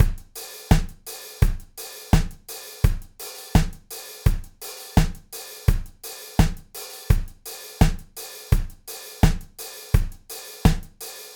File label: beat